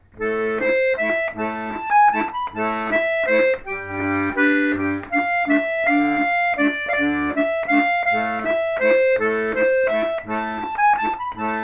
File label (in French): valse